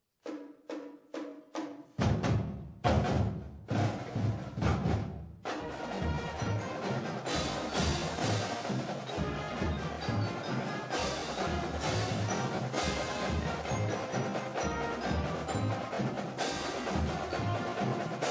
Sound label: fight song